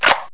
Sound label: click off; Deers Revenge